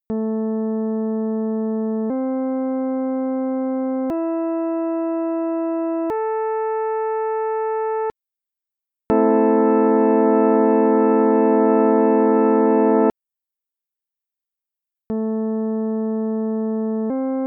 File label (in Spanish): acordes men